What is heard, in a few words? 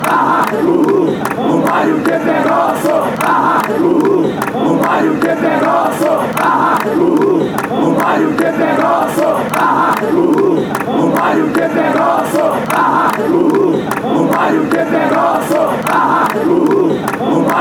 ground ours fanchants